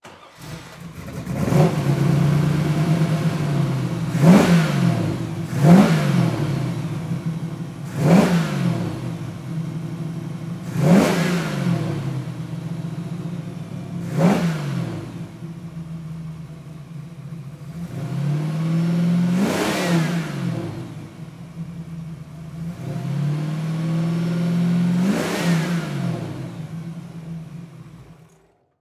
Car 31 Ford High Boy Start Idle Rev Off Mic In Front; transportation